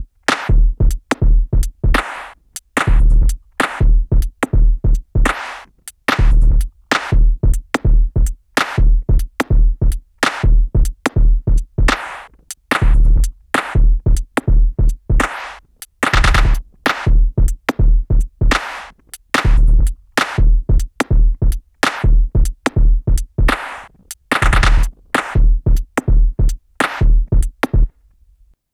drum loop; speedcomp

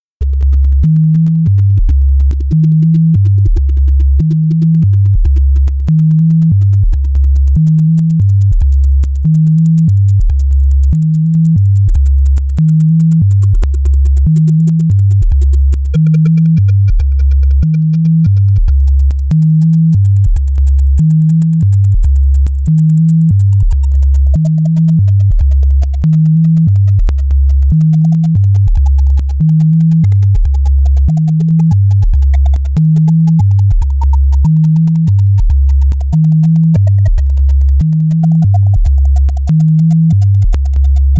karaoke

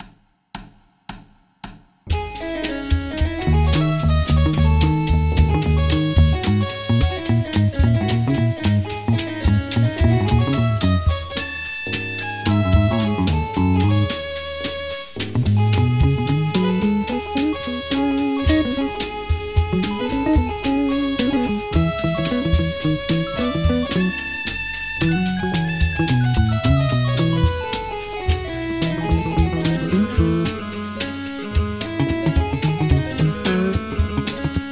waltz; chaosconstructions21

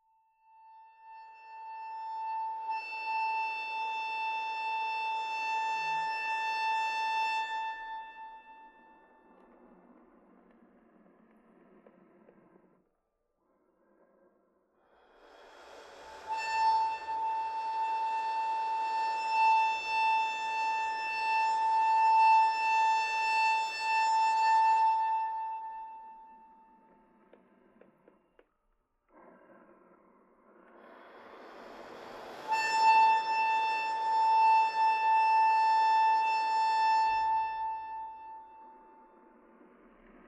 noise; earlabs

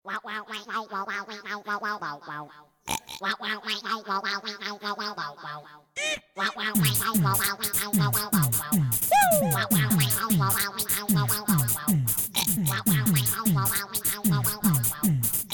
rysk techno; dreamhack03

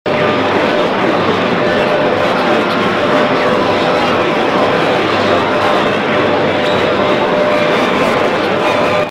babble; earlabs

